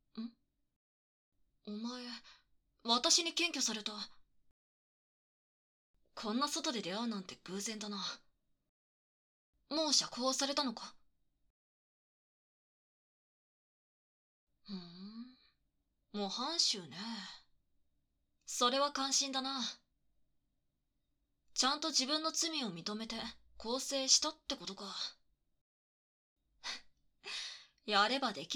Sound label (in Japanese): 耳かき; 後日談; 警察